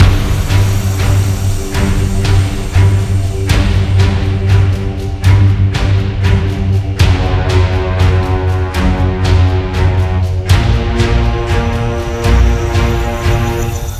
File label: freespin intro; dark king